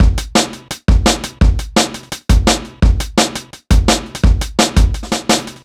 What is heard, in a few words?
E Beat, Electronic Beats